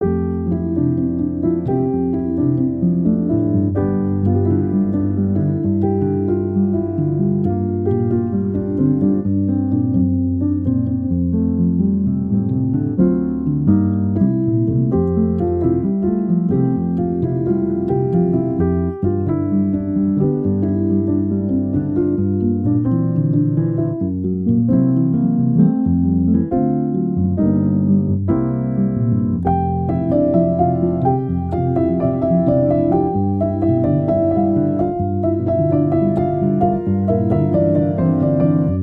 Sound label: Elevator Music